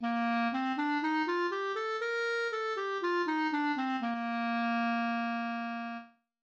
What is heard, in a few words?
major scales clarinet